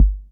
Kicks, Elektron MachineDrum SPS1 MKII

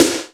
herbo snare; Greytsounds; Scott Peers Sound Engineering

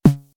snare, DL-909

